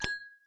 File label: Additional move